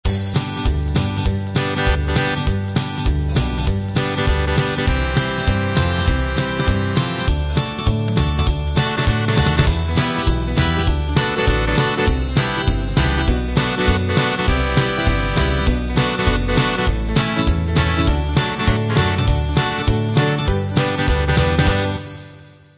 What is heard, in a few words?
gospel